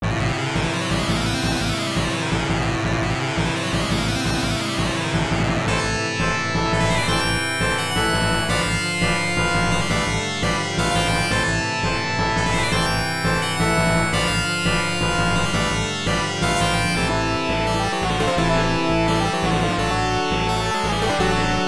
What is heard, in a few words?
Boss BGM